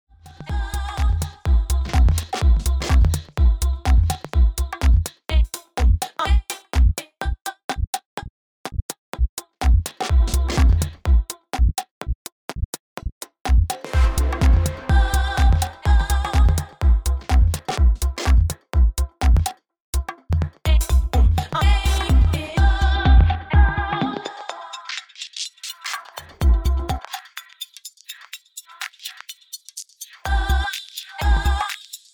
DJM-900NXS2 Sweep

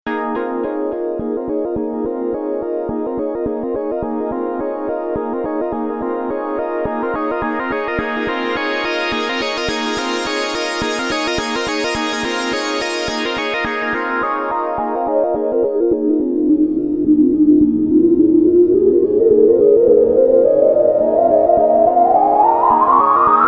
REV2

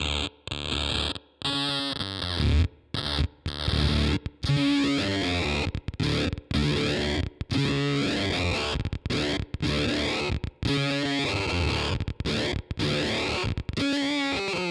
4046 m Bitcrusher; Industrialectric

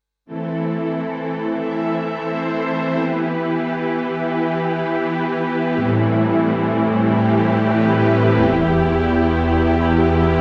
Kawai MP7SE Warm Strings

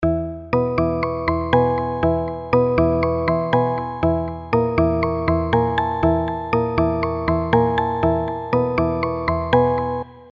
Sinepiano

